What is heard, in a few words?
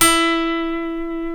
F#, funk guitars; Spectrasonics; Hans Zimmer Guitars VOL-1; TAKA FUNK